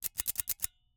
Icecream Scoop, metal, mechanical, teeth, gear, squeeze, release, triple; Eiravaein Works; Vaeyan IV